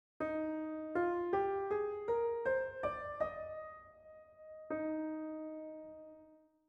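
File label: Eb major scale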